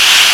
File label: Applause; SNES; Total Football